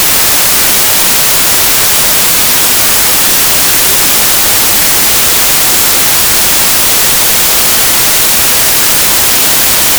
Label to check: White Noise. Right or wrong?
Right